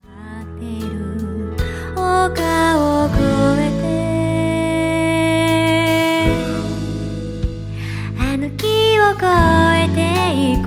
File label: melody sample